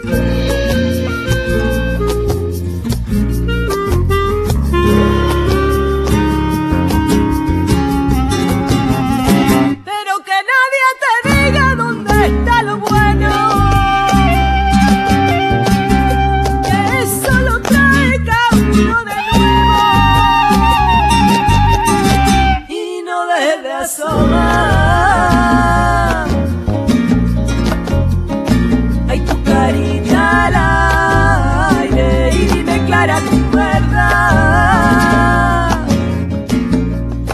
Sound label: Flamenco Chill